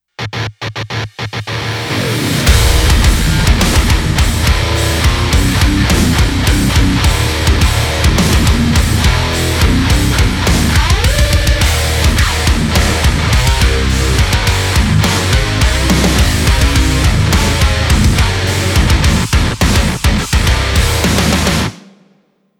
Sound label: metal machine